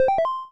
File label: complete; Ontrack EasyRecovery10 Enterprise; EasyRecovery Professional 10.0.5.6